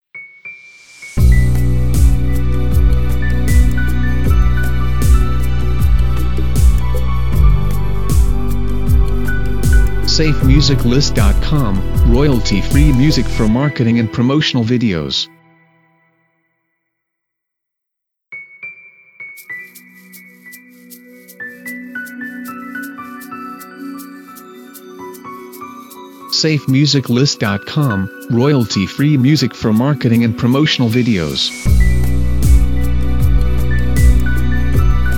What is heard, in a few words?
Tribal Ambient Video Bumpers